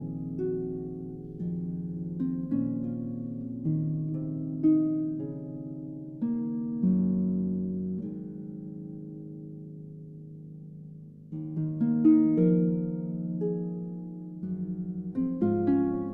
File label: Harp Concerto Lullaby